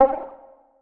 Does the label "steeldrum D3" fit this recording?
No